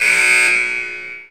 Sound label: Basketball Buzzer